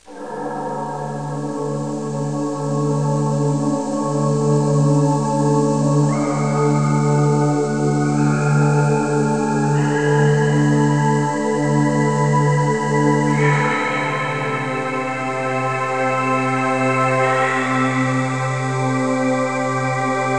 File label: Pad; Great Eagle 2